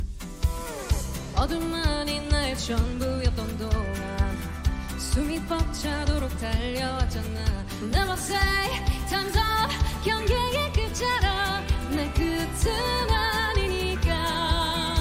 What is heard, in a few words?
kpop, korean song; korean-song-to-english